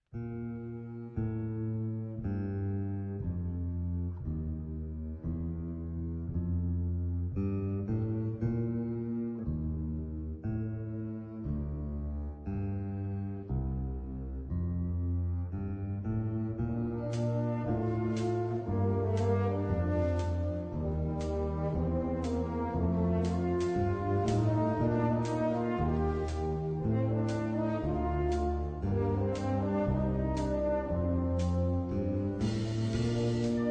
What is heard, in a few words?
Choral And Rock Out